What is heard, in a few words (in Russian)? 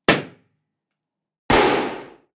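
хлопок лопнувшего шарика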